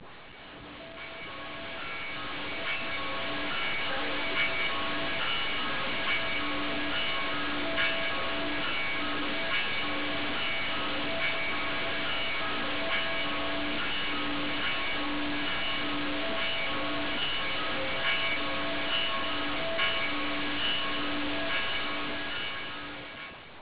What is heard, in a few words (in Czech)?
zvony; kostel